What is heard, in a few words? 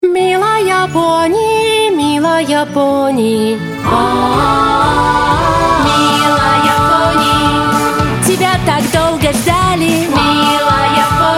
Russian singing